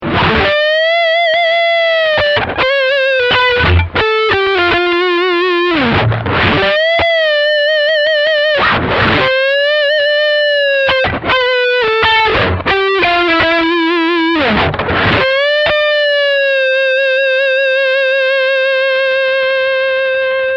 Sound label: Lead